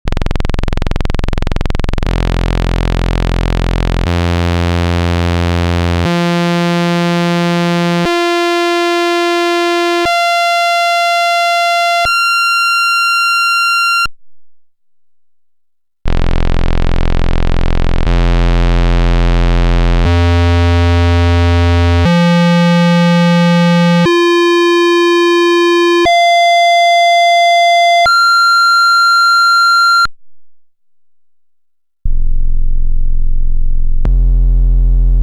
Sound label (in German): Dreadbox Polyend Medusa Analoge Oszillatoren